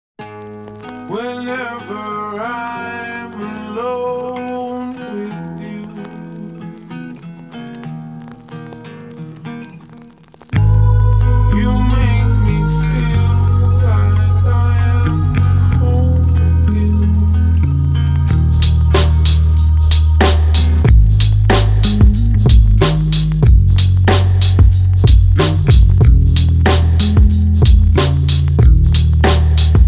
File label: beat